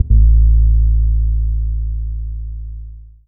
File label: kickdrum